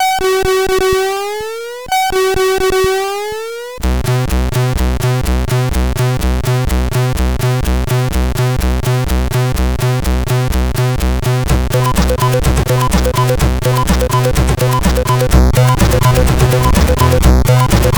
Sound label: Good Beat, CSG8580R5